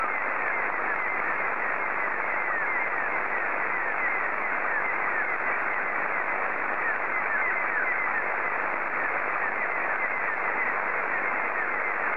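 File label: SSTV, Scottie 1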